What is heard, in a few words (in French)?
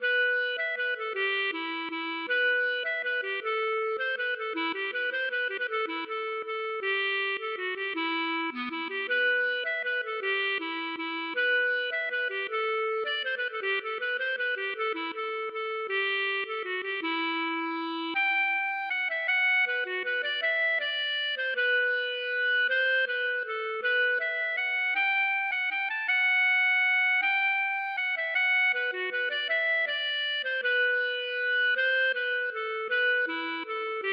valse